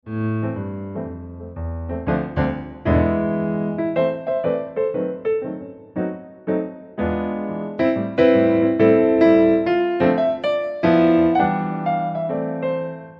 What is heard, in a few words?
lullaby